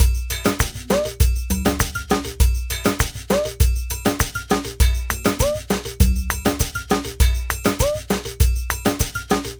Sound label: Ensemble, Latin Percussion